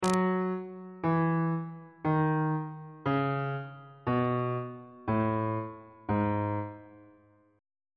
a flat phrygian mode descending on bass clef

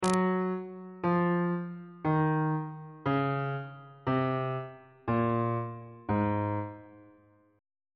a flat mixolydian mode descending on bass clef

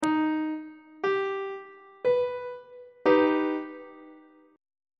d sharp augmented chord on treble clef

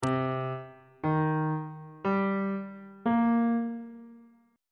e flat lydian 7th chord 6 is c minor 7th

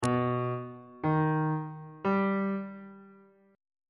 b augmented triad chord

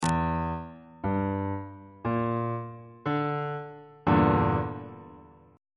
g minor 6th 3rd inversion on bass clef

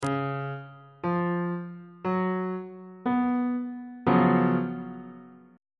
g flat major 7th suspended 4th 2nd inversion

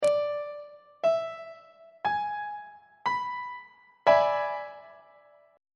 d double sharp dominant 7th 3rd inversion; treble clef

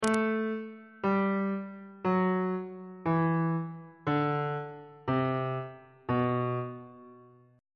c flat phrygian mode descending on bass clef

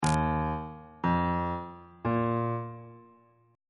e flat suspended 2nd triad chord intervals

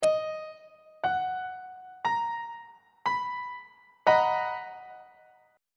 b major 7th 1st inversion; on treble clef

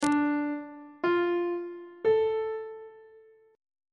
d minor chord 1 is d minor on treble clef

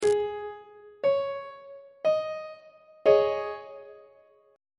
a flat suspended 4th chord on treble clef

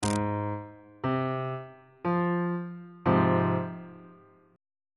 f minor 1st inversion

